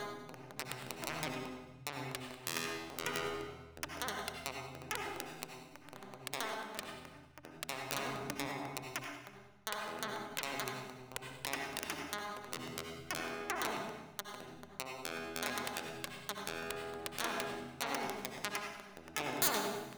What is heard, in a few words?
guitar